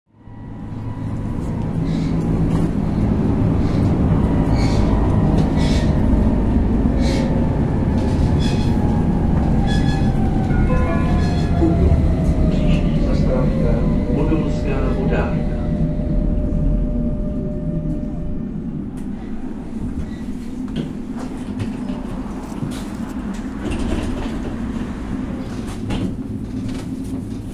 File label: hlas tramvaje voice of tram